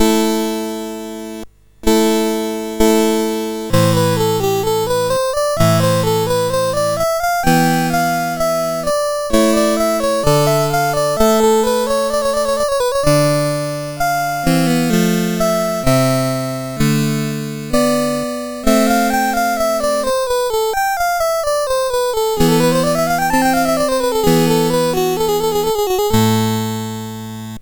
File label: Intonazione, CSG8580R5